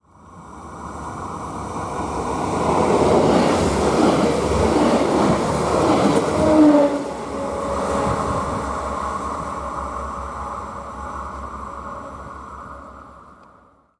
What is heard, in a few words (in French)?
trains diesel et electriques; sonotheque